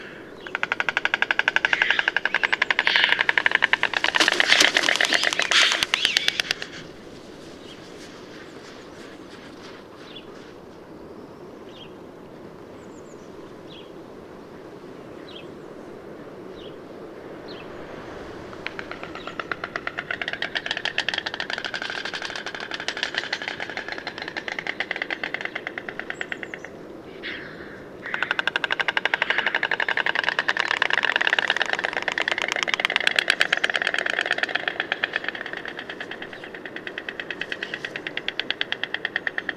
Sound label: birdcall